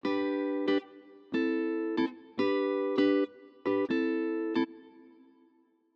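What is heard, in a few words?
brekkie lowkey head 93bpm G major 8 beats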